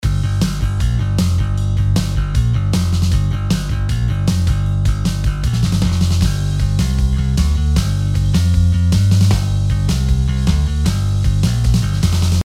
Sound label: Pick